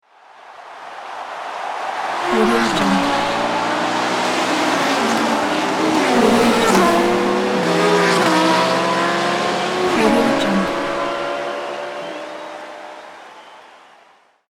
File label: car race with crowd cheer